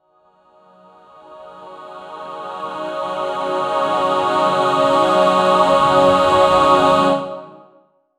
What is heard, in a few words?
triumphant music like a s, choir